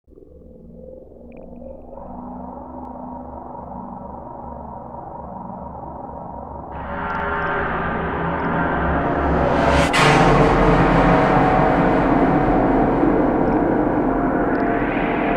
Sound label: Hurricane Strings; Valve Collection; Half-Life Soundtrack